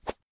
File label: Arrow Fly By